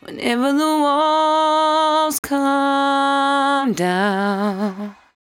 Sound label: 74-5bpm B, Velvet Vocal; While U Could; Zero-G